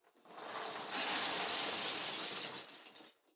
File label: Loose Fill Packaging, Far Put In Box; 梱包材